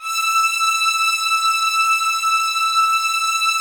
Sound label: E6, LOUD VIOLINS; Q Up Arts; Denny Jaeger Master Violin Library